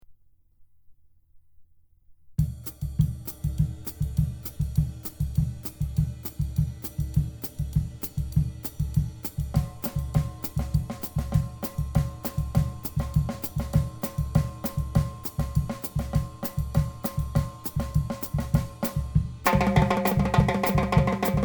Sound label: Solo Timbales